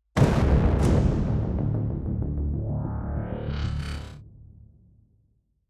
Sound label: 190bpm; Legends of Pegasus; windows gamerip 2012